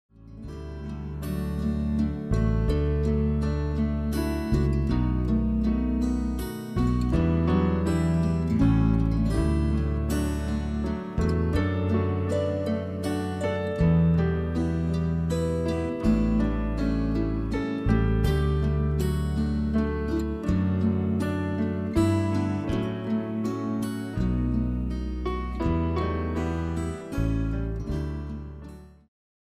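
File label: karaoke instrumental o christmas tree; christmas favorites; disney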